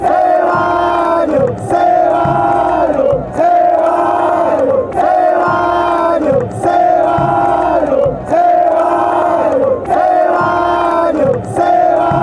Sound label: club chant; club olimpia